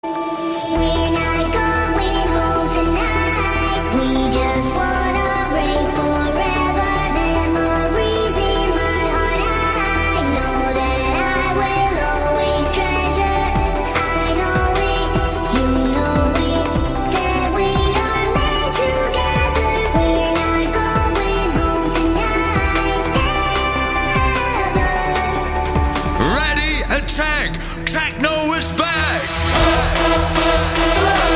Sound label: Techno